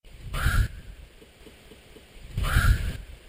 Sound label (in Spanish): Garza bruja canto